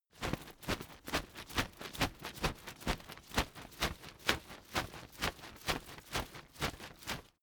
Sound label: umbrella big shake